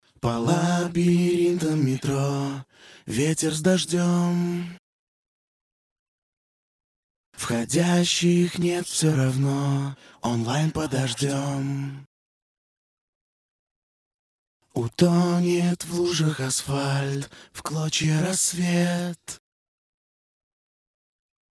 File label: acapella